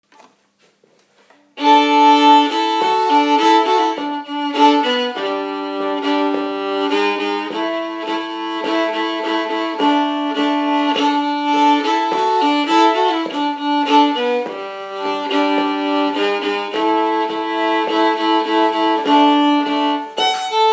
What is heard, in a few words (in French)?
Voix II lent, violons; Jacquot